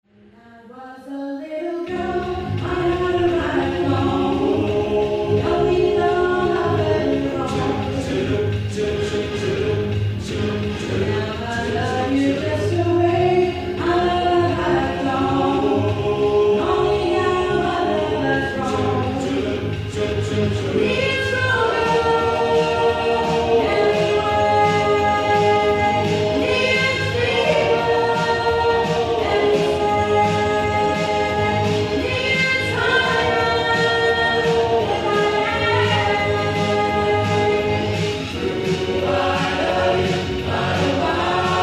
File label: SATB Solo